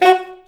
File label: SAX Alto STAB, Alto Section FX